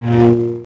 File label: saber spin; weapons